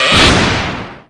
bend staff hard; william

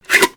visor up; tonate

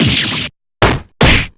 damage

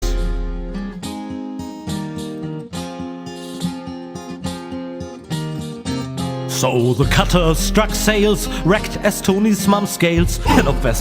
huzza

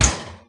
clang; gina

